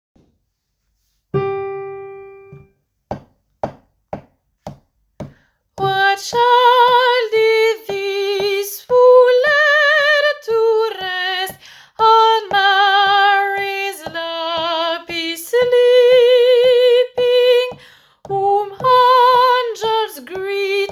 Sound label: What child is this tenor